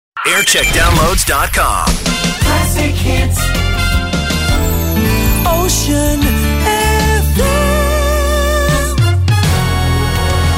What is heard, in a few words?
Jingle Montage; Ocean FM Hampshire; JAM